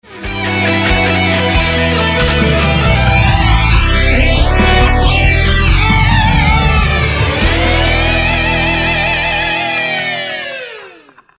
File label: Flange; Simulating Analog Tape Flange In SONAR 5